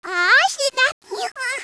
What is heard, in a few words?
Attack; Eft; Flowerpig